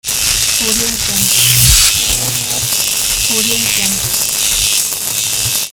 electricity spark

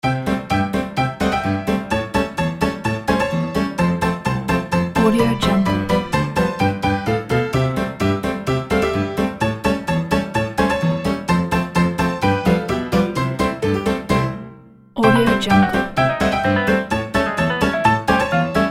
funny old piano loop

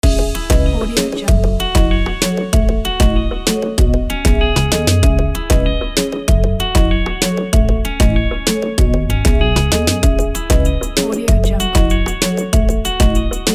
afro pop loop

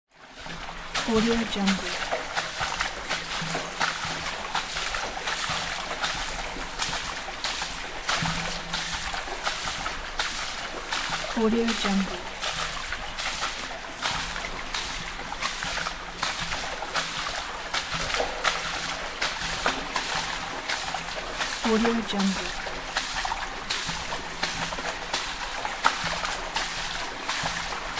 footsteps on puddles

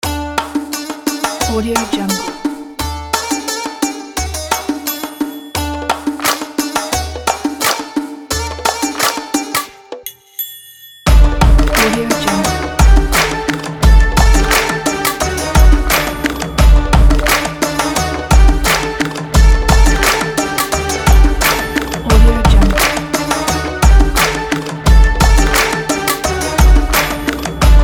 arabian hip hop

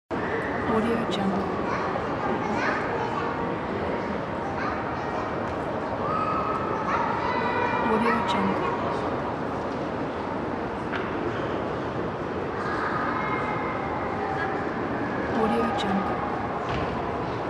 ambience shopping center

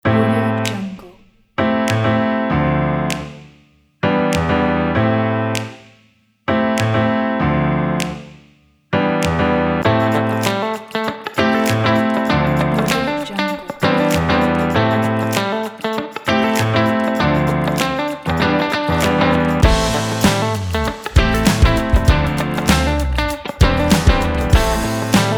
soul kit

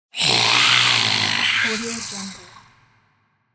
cartoon goblin hiss with reverb

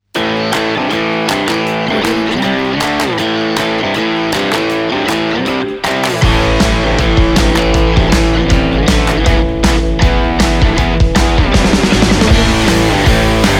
uplifting upbeat rock

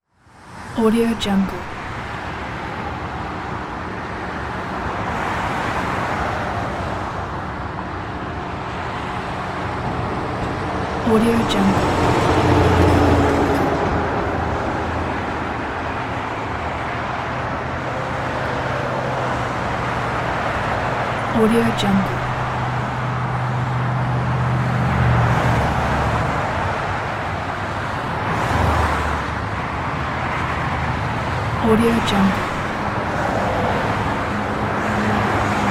busy road; chicasound

